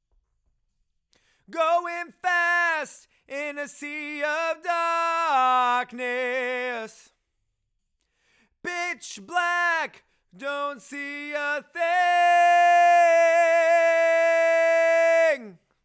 Chorus

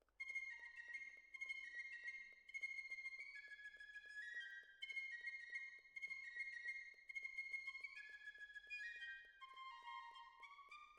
Cellos

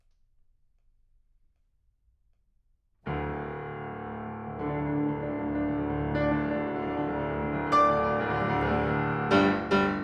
PIANO

